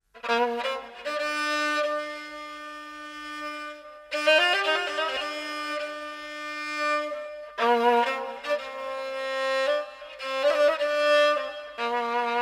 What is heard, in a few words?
Taknavaziye Kamancheh